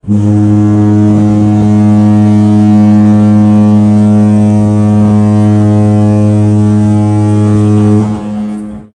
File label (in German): typhoon, kreuzfahrtschiff klingelton; meinschiff3